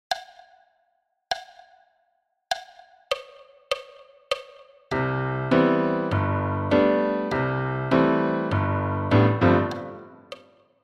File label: Aurora a 100 bpm